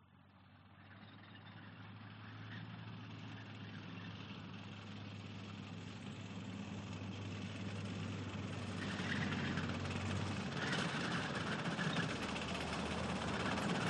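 warfare tank matilda mark 2 1939 pass by close revs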